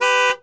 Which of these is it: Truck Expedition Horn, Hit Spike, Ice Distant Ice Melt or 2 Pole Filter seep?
Truck Expedition Horn